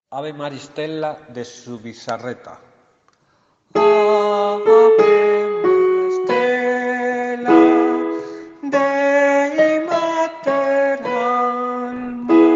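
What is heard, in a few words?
soprano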